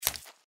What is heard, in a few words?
mantis foot; npc